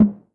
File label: Tom Hi Wide, 808 Toms; Slate; Vintage Drum Machines Volume 2 808 Edition